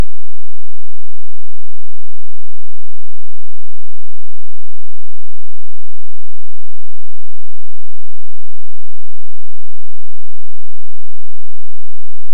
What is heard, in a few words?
bass lead